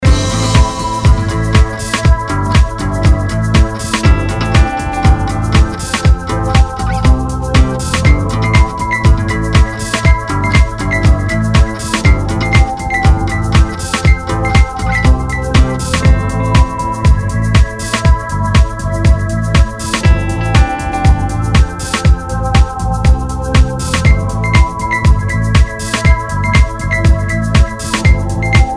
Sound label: Piano Funk Dub Mix